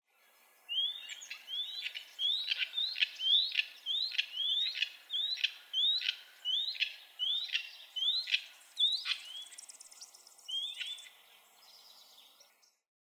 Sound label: Elanio maromero Elanus leucurus